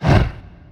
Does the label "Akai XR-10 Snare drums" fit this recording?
No